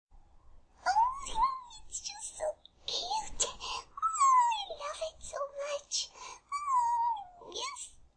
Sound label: Cute noises; Fluttershy